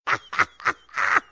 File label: laugh; hl2dm